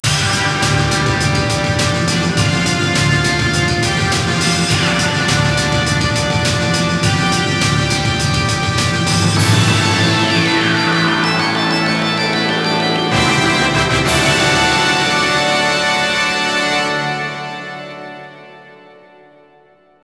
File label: Str G#